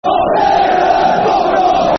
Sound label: Football Chant (Soccer Song), Newcastle United; Get Into Em; Newcastle United Nufc